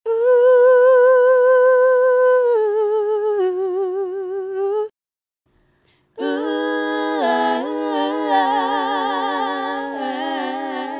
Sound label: A Cappella wash(dry)